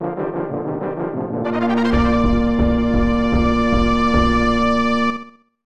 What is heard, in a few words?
Fanfare; DK Country